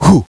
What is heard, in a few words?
"hoo"; jazz jackrabbit 2; M8